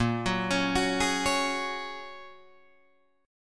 A sharp Minor 7th Sharp 5th A sharp, Guitar Drop D